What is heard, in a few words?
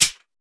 acoustic snare f key, snare drum tuned to the F note, Loudest frequency: 7008Hz; snare drum samples